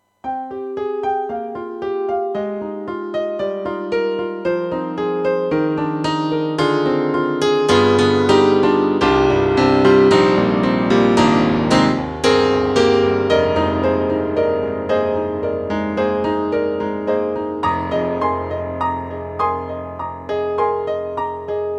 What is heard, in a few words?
YAMAHA MU500 Brite P